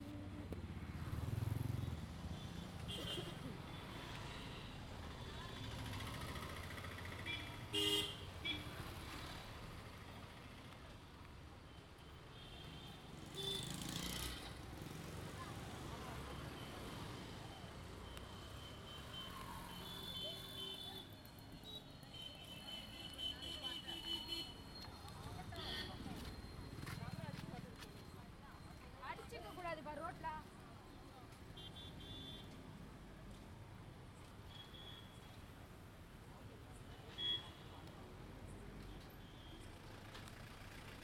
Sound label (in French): ambiance rue et chien; une chambre en inde